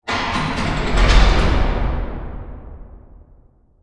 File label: distant railroad track raise